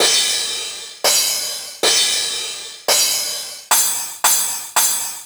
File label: 909 Crash